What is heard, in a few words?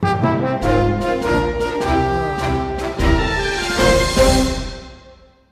fanfare